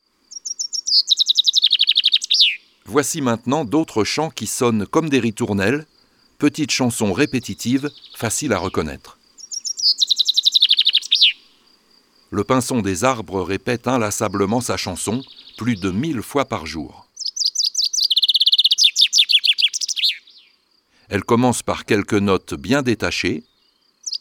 Oiseaux des jardins Pinson

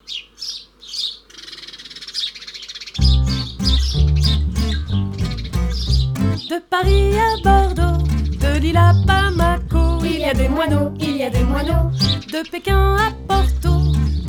La java des moineaux; cuicui et compagnie

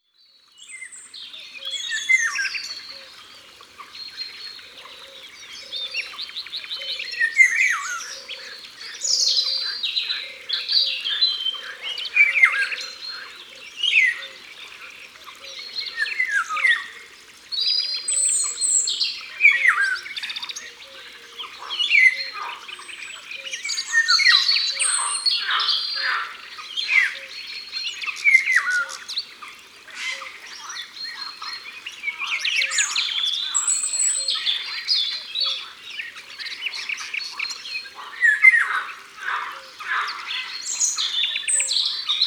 Loriot d Europe, Au bord de l eau

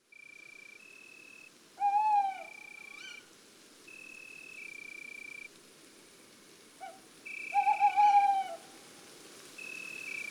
Chouette hulotte male Chants d'oiseaux des bois et des forets

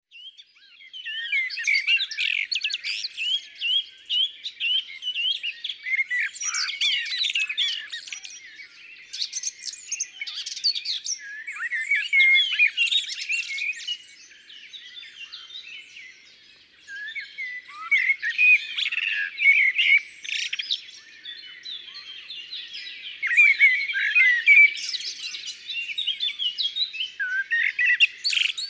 la forêt; Mon imagier sonore de la nature

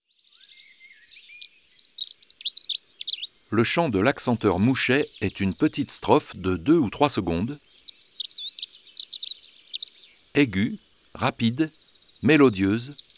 Accenteur mouchet, Oiseaux des jardins

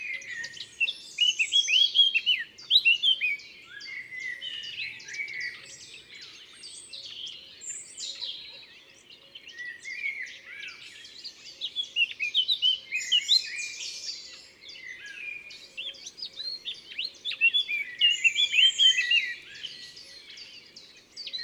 Fauvette a tete noire; Drome; Concerts Naturels de la Drome; Studio les Trois Becs

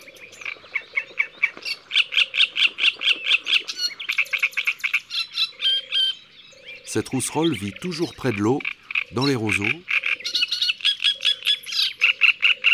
Rousserolle turdoide; ABC des chants d'oiseaux

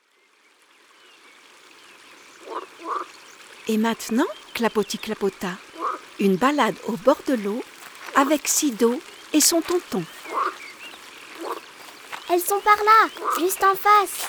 Les grenouilles vertes, Une balade au bord de l eau; Studio les Trois Becs

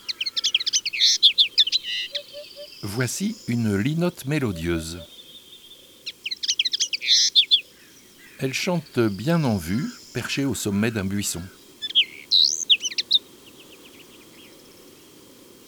Linotte melodieuse, chants d'oiseaux

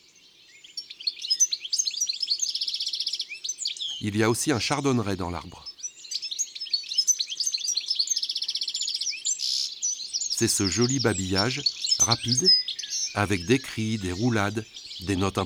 Chardonneret elegant, chants d oiseaux; ABC des chants d'oiseaux

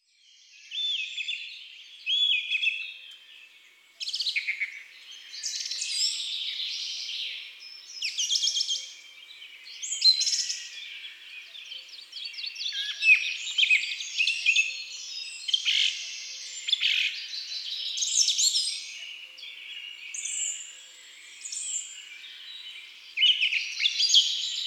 Bois et forêts

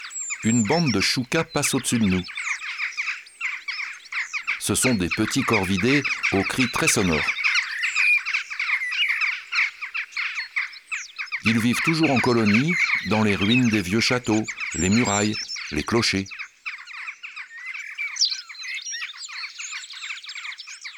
Choucas des tours; ABC de la nature

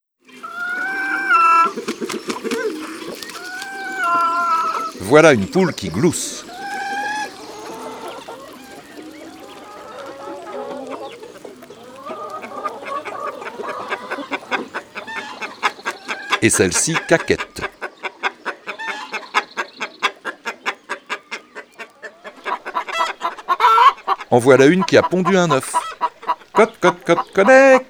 Poules et coqs

